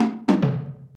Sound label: Tomtom Falling